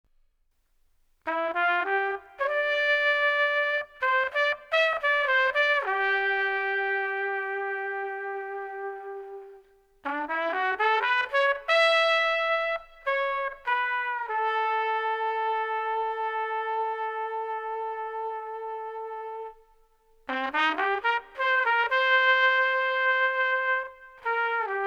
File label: Street Trumpet Dreamy 92